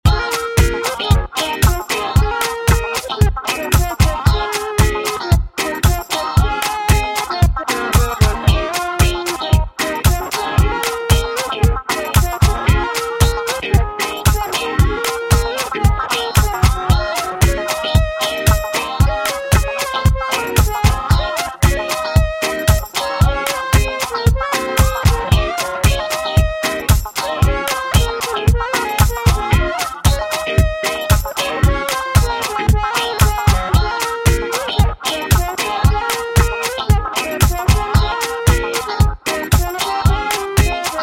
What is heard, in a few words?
114 Abmaj Steppin Out